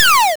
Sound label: player shoot